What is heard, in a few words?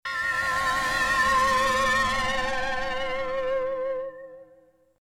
swell tonal noisy